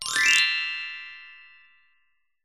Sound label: magic fast